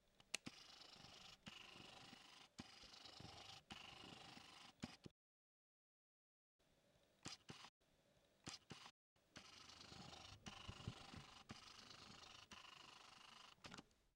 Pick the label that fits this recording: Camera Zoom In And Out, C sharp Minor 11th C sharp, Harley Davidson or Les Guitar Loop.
Camera Zoom In And Out